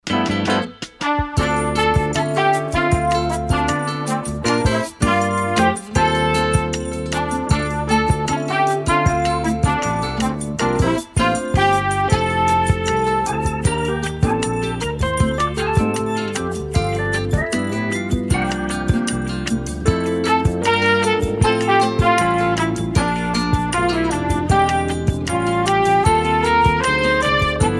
christmas kit instrumental beds god rest ye merry gentlemen